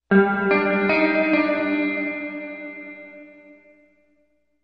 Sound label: Music Logo Spy Movie, guitar